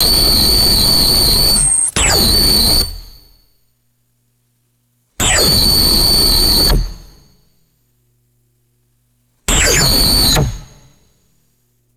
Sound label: retro sci-fi laser beam blasts; lasers